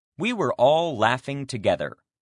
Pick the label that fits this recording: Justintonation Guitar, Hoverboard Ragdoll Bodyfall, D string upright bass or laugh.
laugh